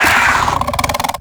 Combat Vocal Attack Medium; Warframe; Catbrow

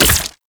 Sentient Rifle Mech; Warframe; New War

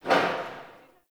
Metal Garbage Hit; Warframe; Ambience; Nineteen Ninety Nine

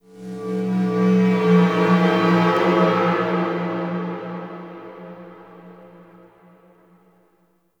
Sentient Texture Medium, Ambience; Sentient Lair

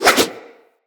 Axe Small Spin Swing; Weapons; Swings

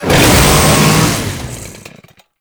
Saw Melee Swing; weapons; Warframe; Grn Ghoul Saw Player; Kuva Ghoul Saw

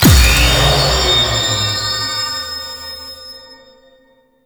Enemy Spawn; Warframe; Duviri; Dax

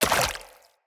Root Spider Mother Step Water, Spiders

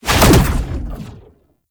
Teleport Reappear; Enemies; Abilities; Warframe; Tau; Dax Ninja

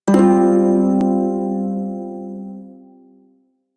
Shawzin Pent Min Chord G; Warframe; Prime Shawzin; Chords

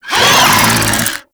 Desert Skate Vocal Attack; Warframe

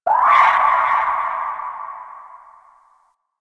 Night Distant Creature Small; Eidolon Night; Warframe; Eidolon Plains

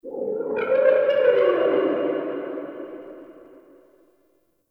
Unstable Texture; Ambience; Warframe; Shadowgrapher

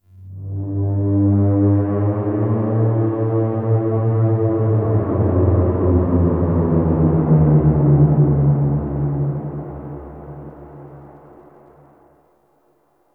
terraforming egg vocal wet; Warframe; Tau

